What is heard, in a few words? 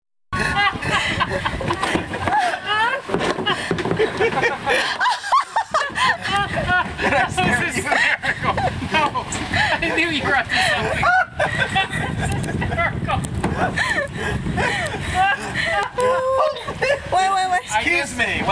hysterical laughter; palau